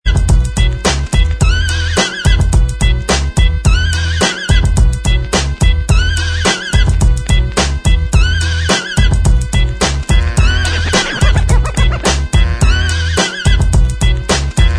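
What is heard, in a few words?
instrumental, backing tracks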